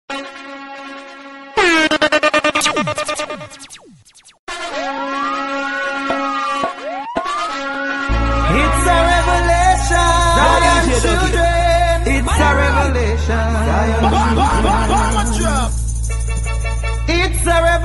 jamaica reggae gospel and mento

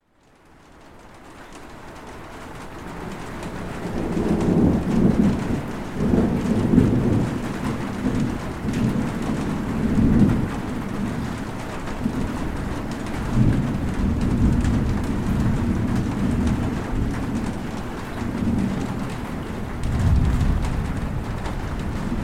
Thunderstorm by Lake Victoria; SR ASMR

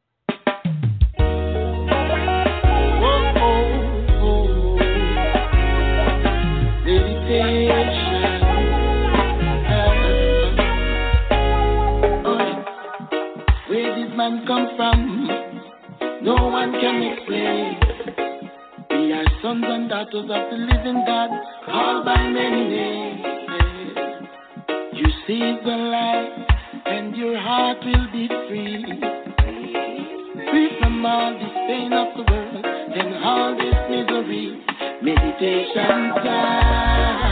reggae; friday evening drive with reggae on deck; caribbeanradioshow

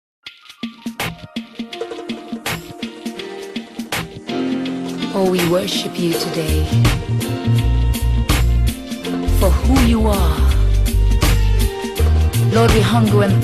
gospel reggae mix

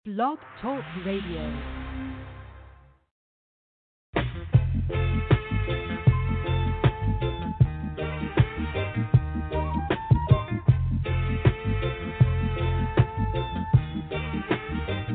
live ska rocksteady reggae oldie 60s 70s 80 90s; caribbeanradioshow

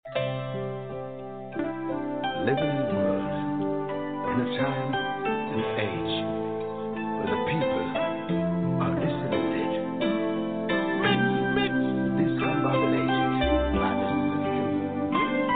just the reggae music; friday buzz

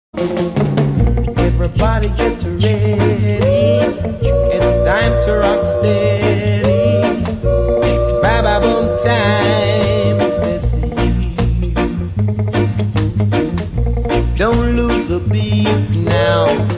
rocksteady oldies radio roots reggae

